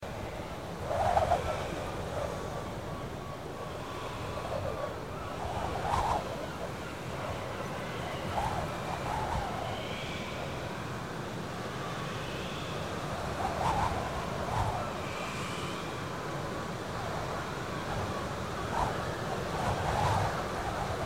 ambiance vortex; Otems Defiance

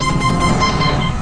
Magic; Conquer Online 2.0

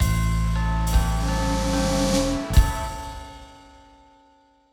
Level lose; mermaid adventures the frozen time

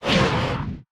Spinny Spin; Grimrush

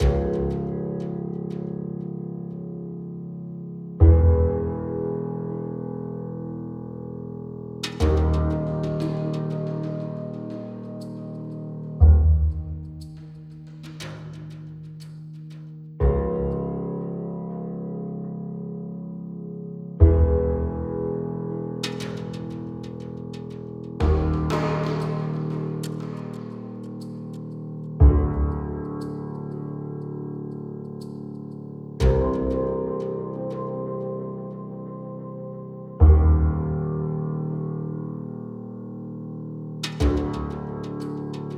Barren Dungeon loop

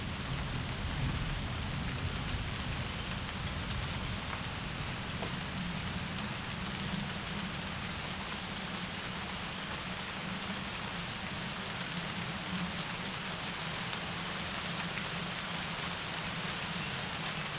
MUSIC rain light

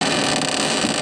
Squeak; gamerip; Zip Zap; Android, iOS

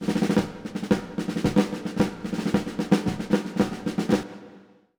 victory

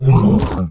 Monster

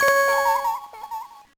Paper Plane Crash; Wario Ware, Inc.: Mega Microgame$; GBA

